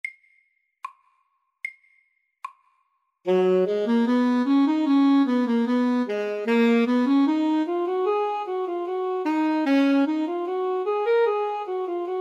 Etyde MIDDELS

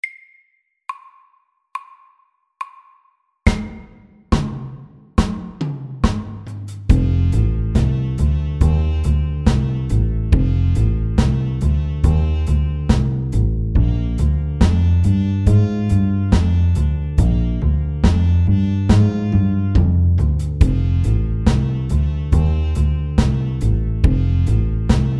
Walking bass SAKTE

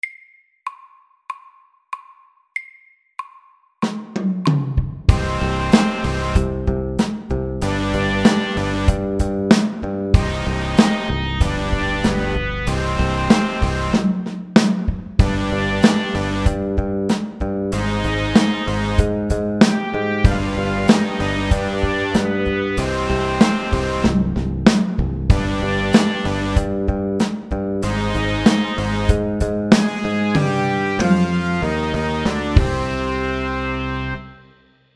Elektrisk rock SAKTE

